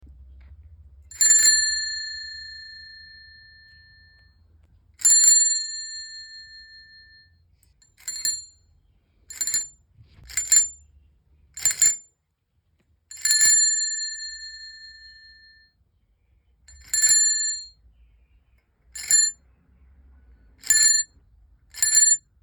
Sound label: bell